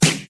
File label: nice acoustic snare sample d sharp key, steel snare drum sound tuned to the D# note